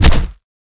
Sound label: bat baseball hit flesh